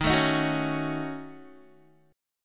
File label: D sharp Minor 7th Flat 5th D sharp down Guitar; strummed